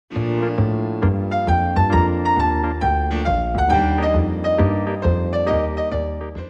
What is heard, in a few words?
jazz trio